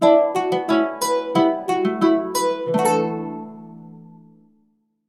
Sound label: jingle